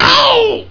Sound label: pain; Alien Arena; drfreak